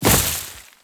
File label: Step Veg, Footsteps; Enemies; Warframe; Grineer